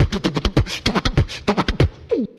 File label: leadin, Beatbox, Drum loops